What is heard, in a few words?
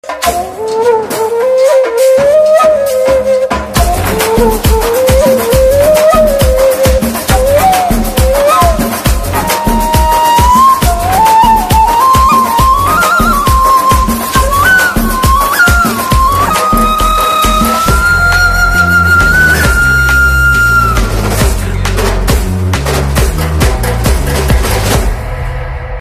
promo bgm ringtone; thalapathy vijay